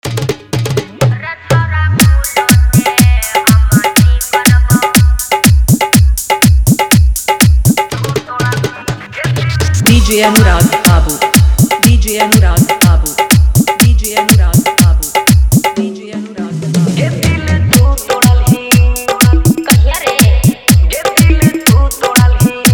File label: Bhojpuri Dj Song Hard Dhollki